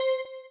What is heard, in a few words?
Key Press, keypad; ZTE F160-Orange-Atlanta